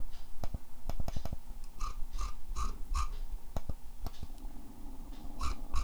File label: audio mouse